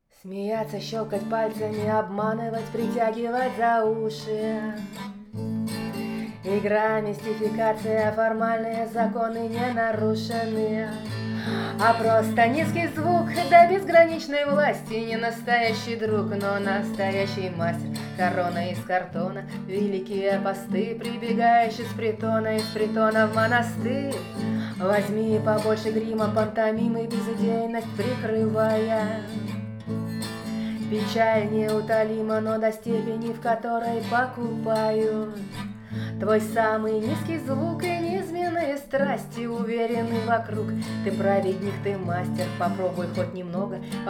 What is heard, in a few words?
ASMR